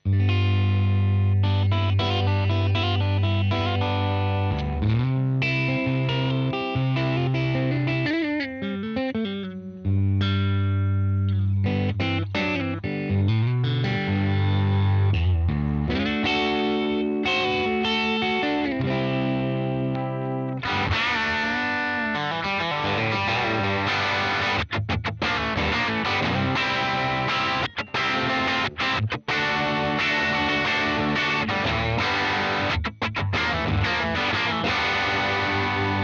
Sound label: Big Crunch Inter Bridge; Égérie kit micros HepCat Broadcaster position intermédiaire puis chevalet crunch